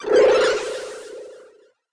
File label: Gate Go Out